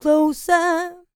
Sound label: LICKS, Vocal Planet R&B & Dance; ILIO